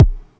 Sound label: Kick OneShot, Processed Percussion; One Shots; Sonic Foundry (Sony Creative Software); Rhythmicronics